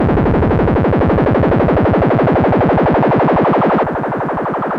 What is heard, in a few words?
monodelay - fm - 104, Loudest frequency 545 Hz Recorded with monotron delay and monotron - analogue ribbon; instruments; loops